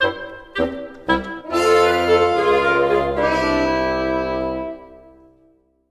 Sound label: Game Over Theme